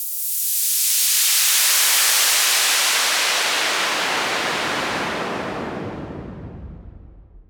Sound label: Fall Clean, Falls